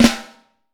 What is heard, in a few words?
FLAM, Vintage Snare Drum; Q Up Arts; Johnny.C's Vintage Snare Drum; 4X14 JCSM